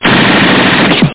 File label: rapid fire